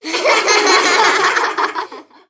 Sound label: Funny Kids